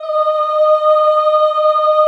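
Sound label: choir ES5, Ooh; Ooh 2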